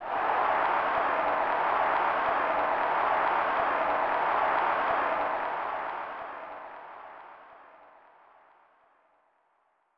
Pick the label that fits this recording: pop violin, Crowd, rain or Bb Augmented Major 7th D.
Crowd